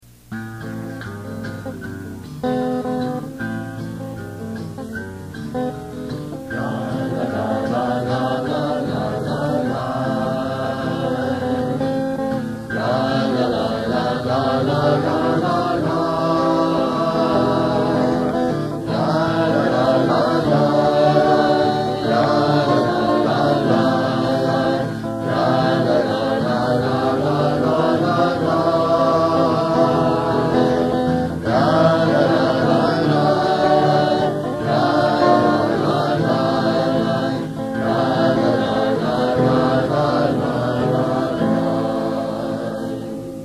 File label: barchu; Folk Service